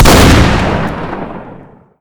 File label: shotgun fire